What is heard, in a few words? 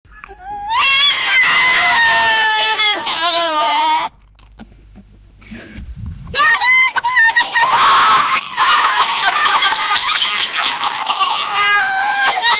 clown laughing and jumpin